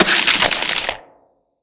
body splat; darkfuture

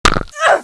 female fall pain

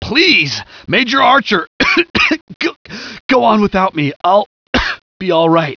generic wounded

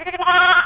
sheep

